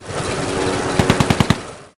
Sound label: Flying Machine Attack